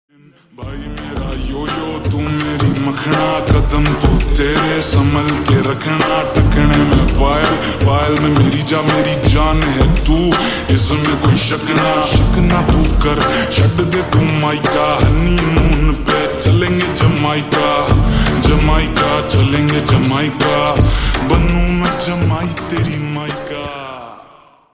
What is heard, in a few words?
Slowed Reverb Ringtone